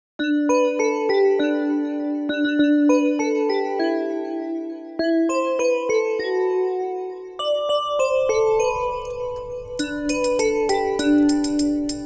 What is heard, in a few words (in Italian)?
Jingle bells italiano Canzoni di Natale